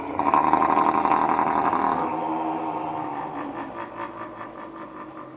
die; svencoop; tor

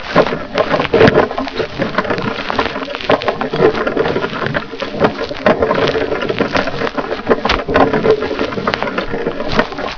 wagon; svencoop; hunger

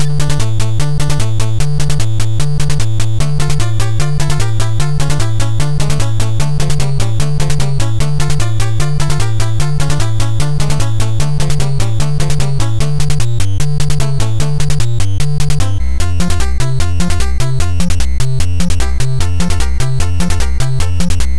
tetris music; svencoop; sc tetris

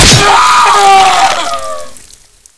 knifed; svencoop

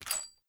Mk2 spoon; svencoop; hunger; weapons

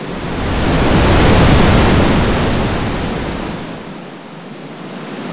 ocean; svencoop; toonrun

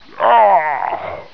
pain; svencoop; hunger; hungerbarney

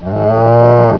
bull idle; svencoop; hunger

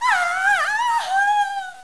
die, headcrab; svencoop; schall

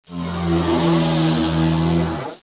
geneworm idle

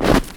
snow; svencoop

